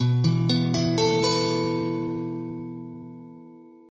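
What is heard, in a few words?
Esus4, Guitar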